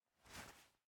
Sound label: Throw Bomb Attack; game audio; Mita; MiSide